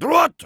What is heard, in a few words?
Heavy meleeing, fr